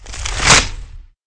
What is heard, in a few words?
root spikes; bravebark